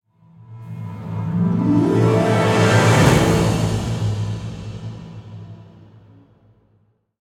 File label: stinger boss intro; roguebook